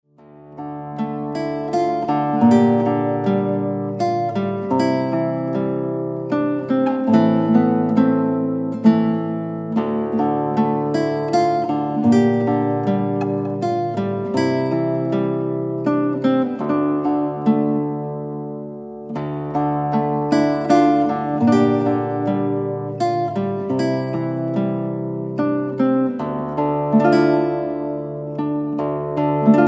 Instrumental

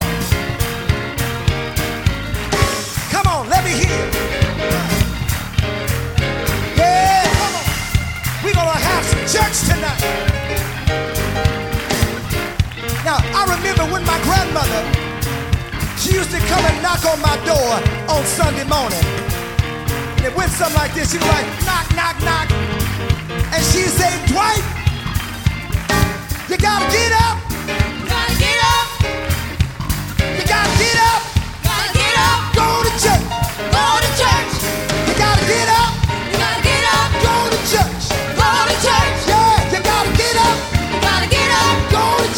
Gospel Church Medley

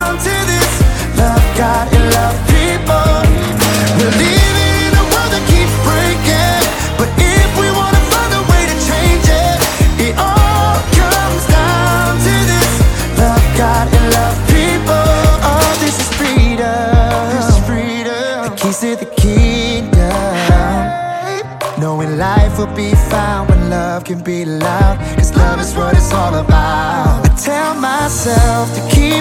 Piano

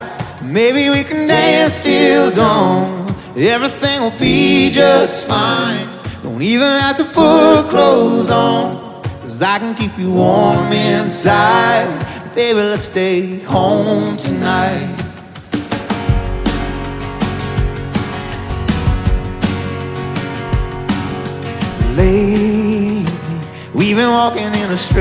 Acoustic Live

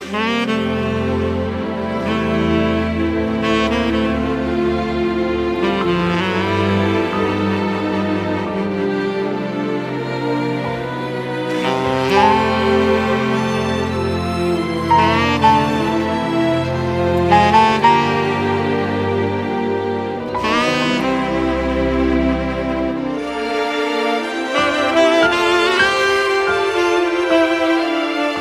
unplugged

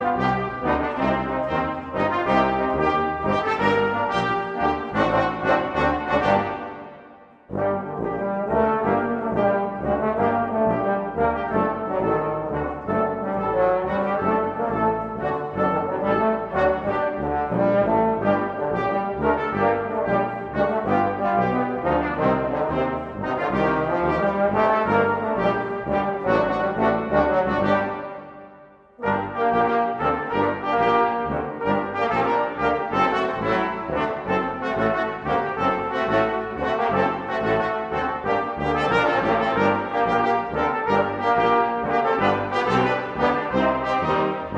Balkan Beats

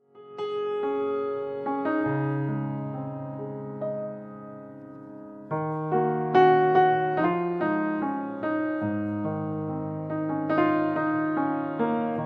Instrumental

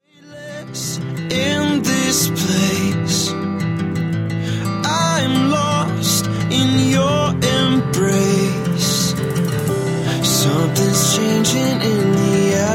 atmosphere

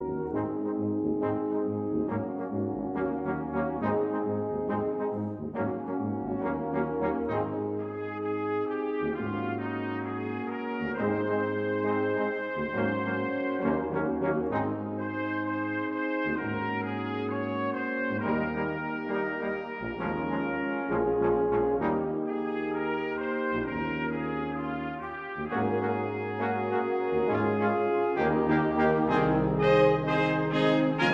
Fanfare and allegro on doxology

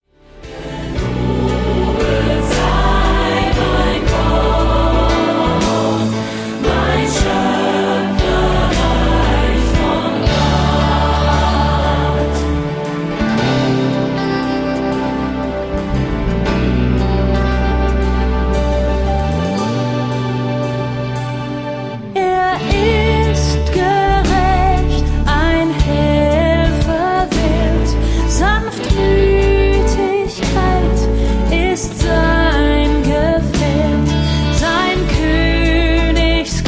Vocals

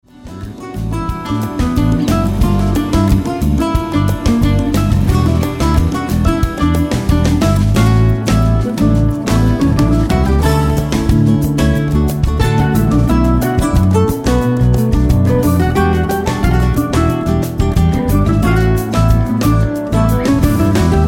Samba

